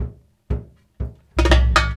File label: RIO, Rio Batucada